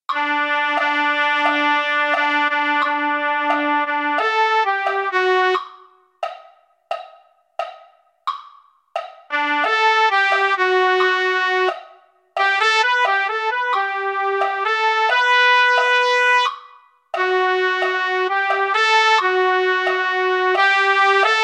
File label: trumpet; holiday brass